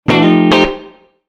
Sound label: instruments guitar; maoudamashii